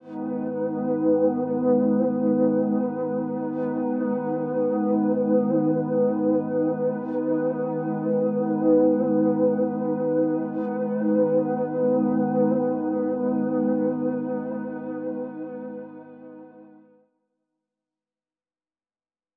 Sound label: 137 BPM G# Min Pad; Cymatics; Black Benz; 2022 Melody Collection Platinum Expansion